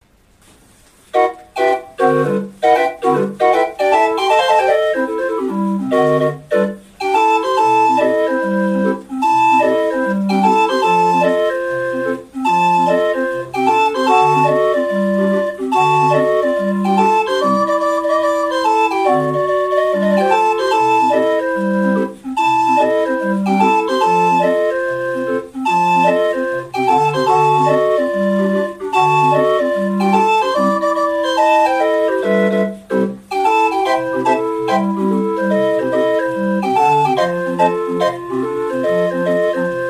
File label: jazz pizicato